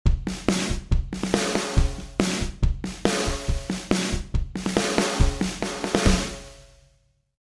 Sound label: processed; lbb